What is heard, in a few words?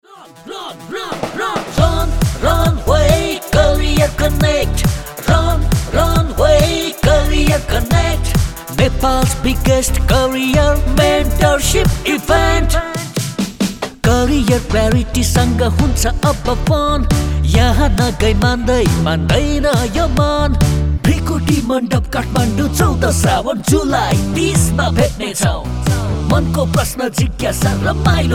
JINGLE MIX